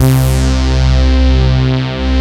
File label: FAT POLY; Pro-Rec - Synthsonic